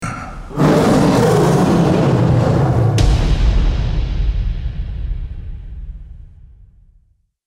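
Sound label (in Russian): 3d rev liva, lion roar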